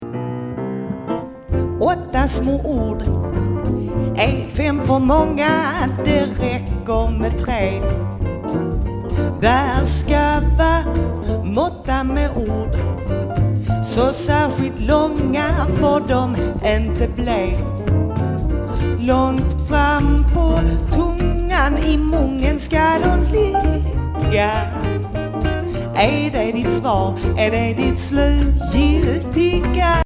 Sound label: Jazz